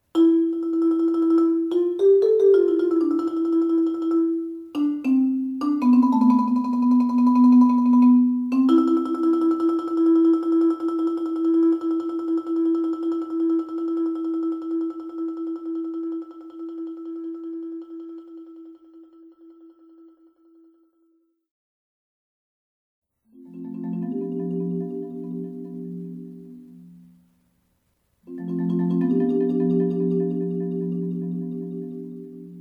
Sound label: solo